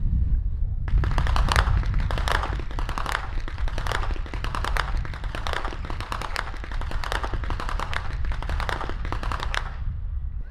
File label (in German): schnalzen; KEMATING I; saaldorf; saaldorf surheim freilassing